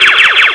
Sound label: stun shot